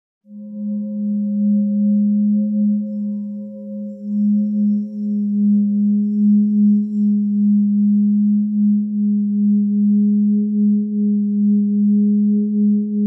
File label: Aha! Point tone